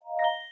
Sms Samsung

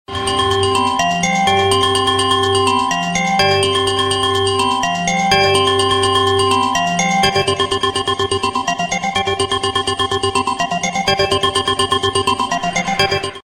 sms little big bells

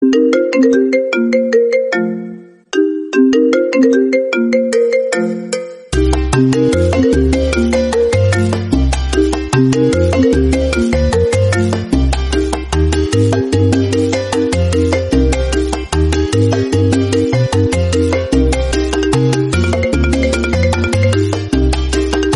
iPhone 6 Salsa

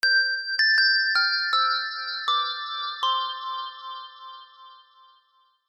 german xmas carol sms

